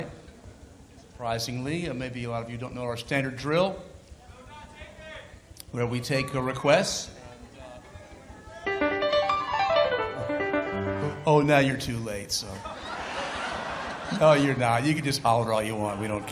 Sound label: crowd